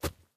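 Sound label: grass, steps; Terrarum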